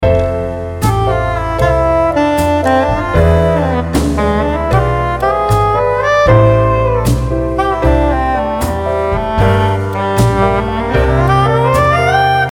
solo

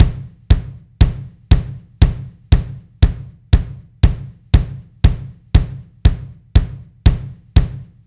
kick

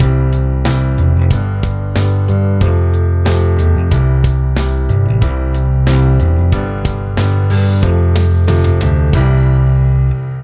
piano

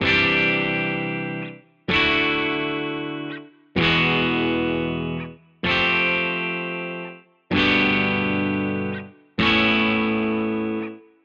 add9 guitar

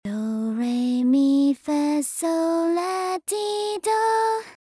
solfa in A

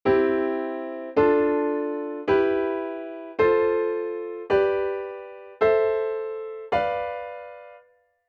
diatonic chords

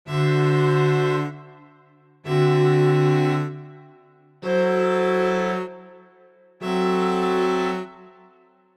VII dd9

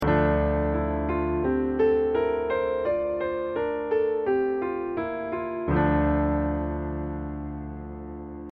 dorian piano

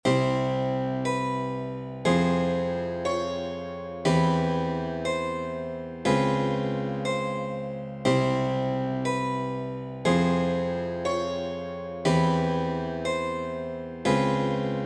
arabic diatonic progression

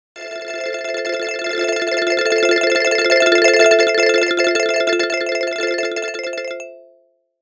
exciter modal, zebra3

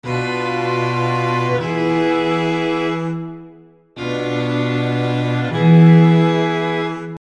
II-V minor

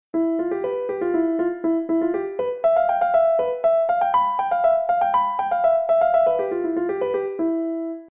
gamelan

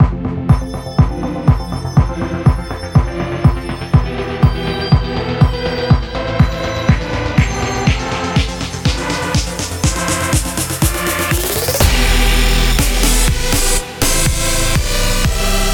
IIIm EDM

